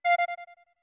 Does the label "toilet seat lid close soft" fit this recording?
No